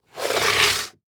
Foley Footstep Hard Sole Dress Shoe Scrape On Hollow Wood Surface; Foley Footsteps